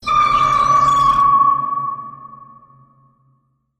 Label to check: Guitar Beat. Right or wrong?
Wrong